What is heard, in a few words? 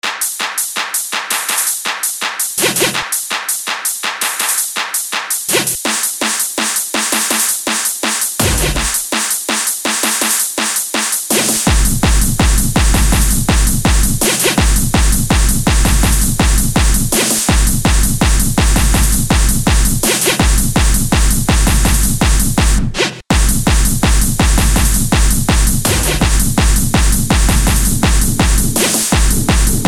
hardstyle; EDC